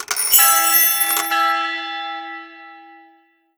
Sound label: Enemy Time Magic Active Clock Ring